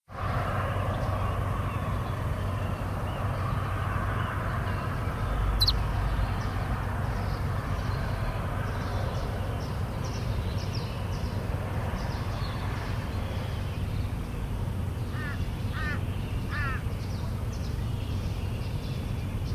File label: Fight background